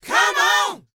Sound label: DANCE 120, Vocal; ILIO; Vocal Planet VOL-4; R&B & Dance